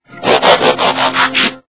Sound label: Transform; Ringtones